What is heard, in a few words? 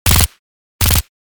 Special forces submachine gun two three round bursts